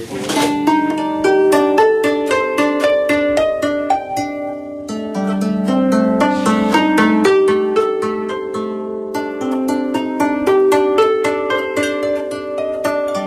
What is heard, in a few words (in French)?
Musique japonaise